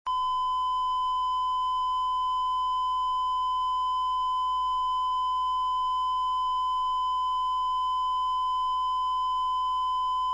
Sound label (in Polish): syrena